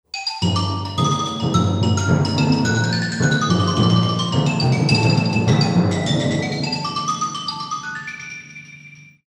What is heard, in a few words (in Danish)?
Slagtoej; Xylofon; Slagtøj og kontrabas